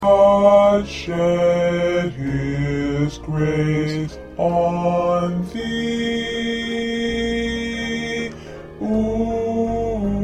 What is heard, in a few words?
2nd Tenor